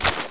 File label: ppsh draw